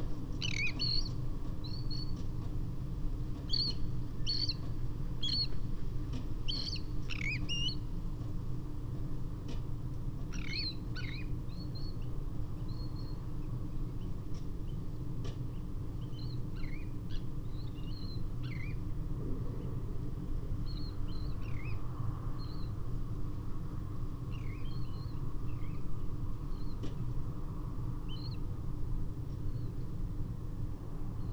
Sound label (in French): Sterne caugek Thalasseus sandvicensis